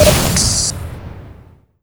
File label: heal grenade explode; tf2